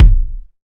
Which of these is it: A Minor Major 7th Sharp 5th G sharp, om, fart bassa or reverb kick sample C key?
reverb kick sample C key